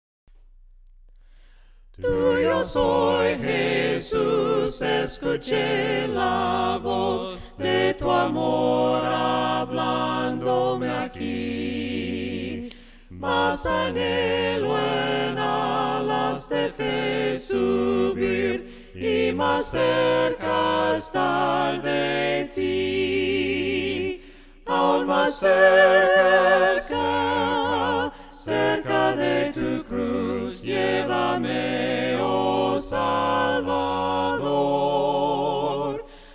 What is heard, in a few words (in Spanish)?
VOCES; TUYO SOY JESUS